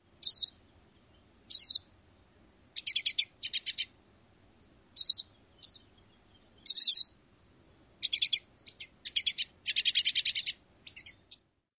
calls; NY

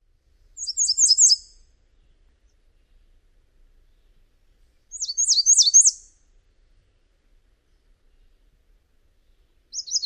songs

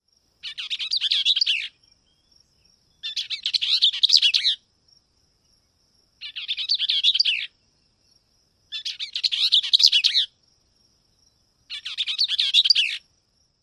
song

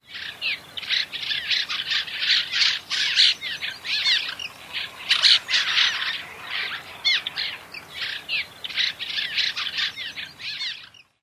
flock calls

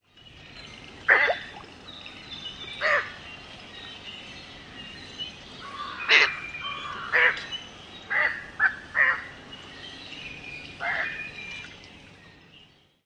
other calls, YCNIHE

